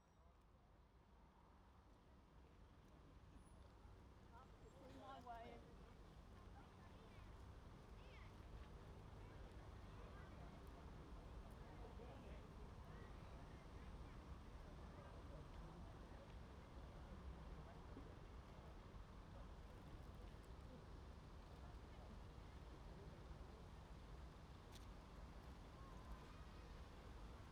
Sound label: steam train